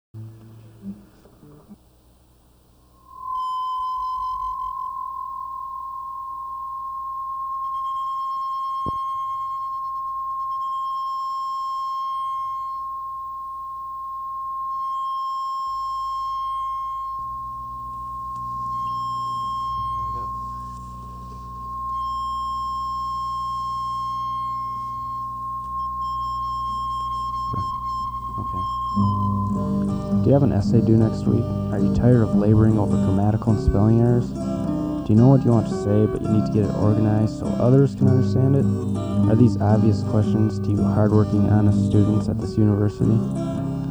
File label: Jingle; Writing Center Jingle